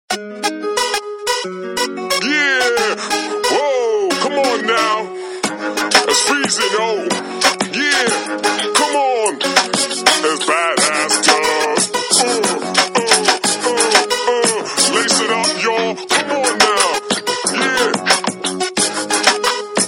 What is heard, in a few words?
Hip hop Guy; Samsung; SGH-F270